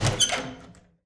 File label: panel open; svencoop; twhltower